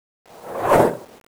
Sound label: whoosh